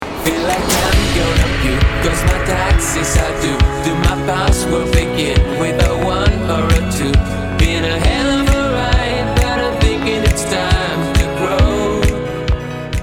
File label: BPM135